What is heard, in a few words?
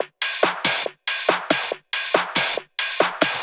Loops 140 BPM Hihat Percussion Loops